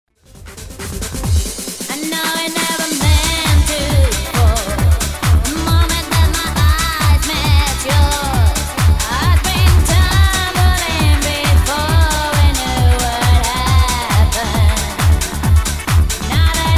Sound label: mix songs electronic